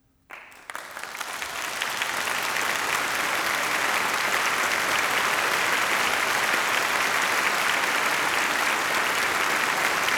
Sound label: Applause out